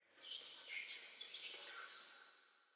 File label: ambience room shadow room working layer